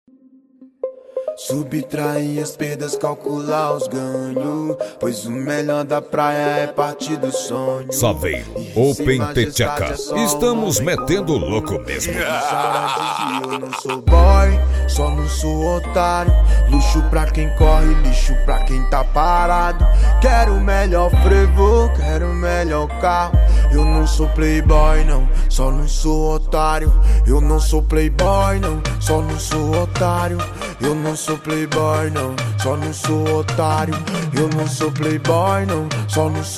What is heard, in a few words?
bass; saveiro open the theca